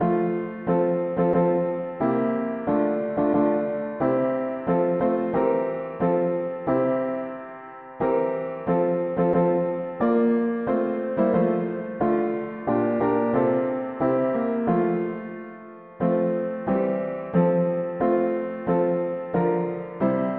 mono piano, hymns

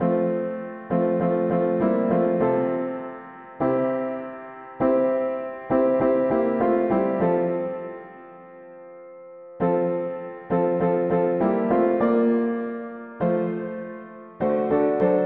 mono piano, hymns; Brightness of the eternal glory

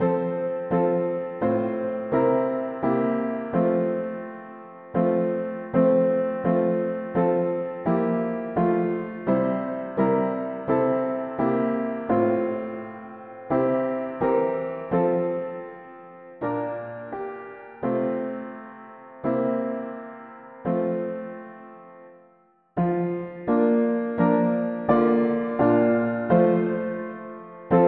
mono piano, hymns; A celui qui nous aime

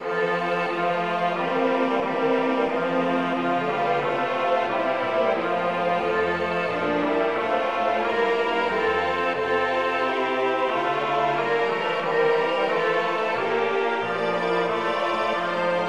polyinstru; hymns

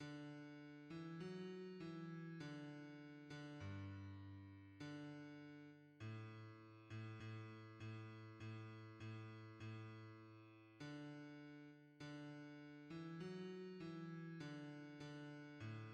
hymns, bass; Father words of mercy

